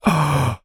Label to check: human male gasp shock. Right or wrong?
Right